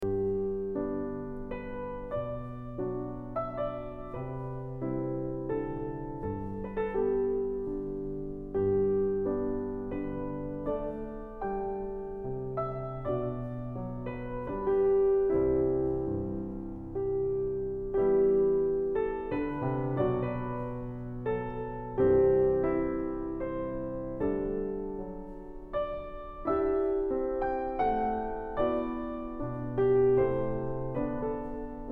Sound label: Piano Solo; lincoln